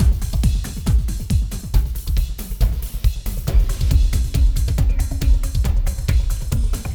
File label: Clubbin' 138